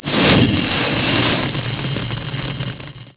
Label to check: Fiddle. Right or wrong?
Wrong